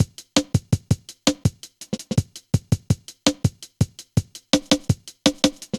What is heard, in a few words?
Skippy, Drum Loops, Jungle; Zero-G Jungle Warfare 1, 2 & 3